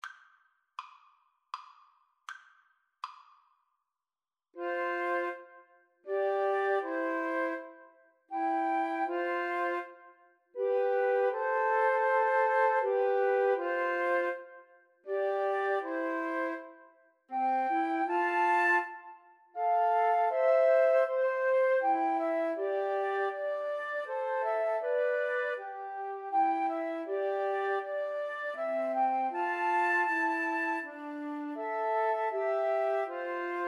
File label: holst jupiter, Flute Trio